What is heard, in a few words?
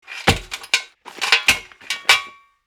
Metal fire extinguisher handling